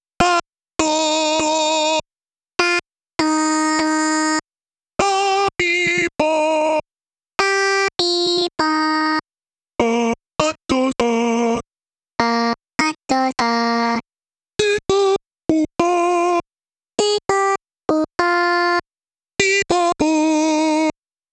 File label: 100 bpm VOX; HOLIDAY MOD STUFF